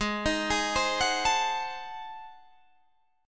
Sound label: Ab 7th Flat 9th Guitar Standard, chord; Ab7b9 arpeggiated; JGuitar